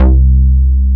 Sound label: BAS, Eurotech; Sounds Good